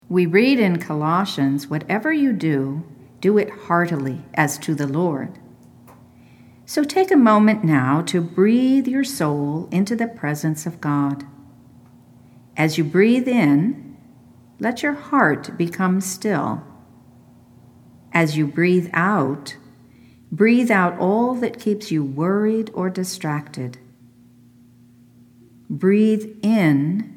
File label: breathing exercise; walk and be well; walking resources; education wellness